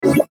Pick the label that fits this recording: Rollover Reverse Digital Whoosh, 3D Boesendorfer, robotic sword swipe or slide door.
Rollover Reverse Digital Whoosh